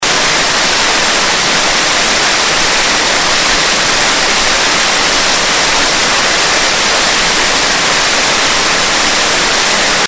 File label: Campbell Falls